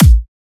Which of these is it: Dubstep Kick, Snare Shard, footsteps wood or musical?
Dubstep Kick